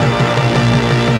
LO END 70S; AMG - Vince Clarke Lucky Bastard

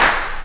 CLAP, Dance Drums

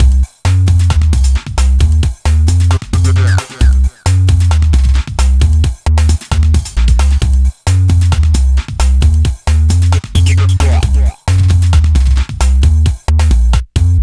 Roller, 133 Cmin, Hardcore Breakbeat; Big Fish Audio; Hardcore Breakbeat Action

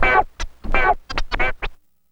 GTR SCRAT; E-MU Formula 4000 Series Vol. 1; Hip Hop Nation; Funky GtrLicks

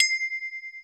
bell, tonal percs; Crypton - DrumBase5000

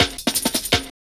Played Cut, Drum Loops 166.5; Zero-G Jungle Warfare 1, 2 & 3

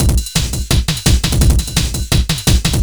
LOOP, Eurotech; Sounds Good

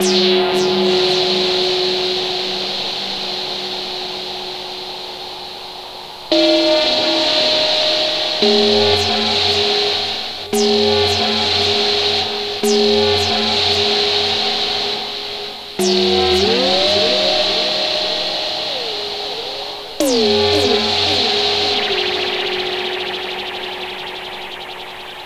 Psychic Wave; Sonic Foundry (Sony Creative Software); Loop Noir; Session 08 - Psychic Investigator

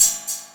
Hat Room, TR-606 Bent Samples; Sonic Foundry (Sony Creative Software); The Electronic Music Manuscript Collection; Battery 3 Sample Kits

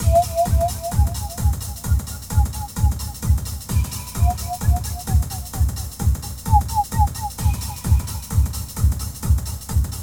FEEDBAK, DRUM LOOPS; Zero G; Malice In Wonderland

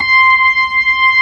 B3, Drawbars

